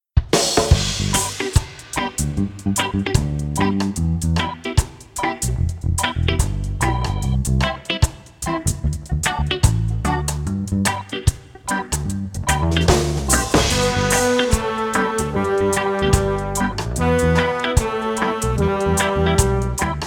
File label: flute; Hot Cross Buns